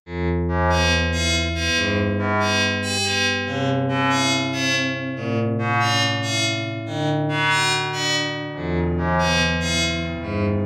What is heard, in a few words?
bow bells